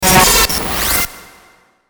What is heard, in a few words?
Digital Glitch Reverb; glitches; Blastwave